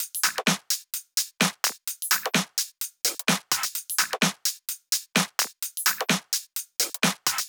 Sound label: 128BPM Drums; Vengeance Samples; Uprising Kit